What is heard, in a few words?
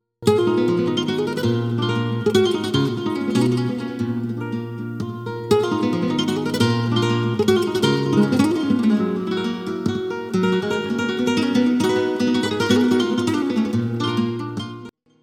Solea